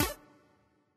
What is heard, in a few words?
collect; Aviamasters; bonus